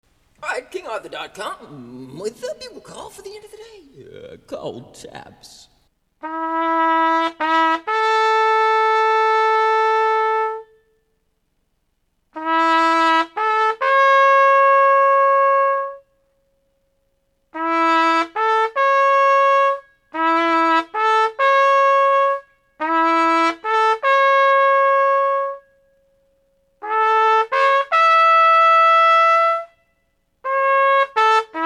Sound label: Bugle Call Taps